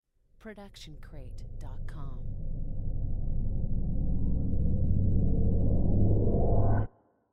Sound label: underground swell; soundscrate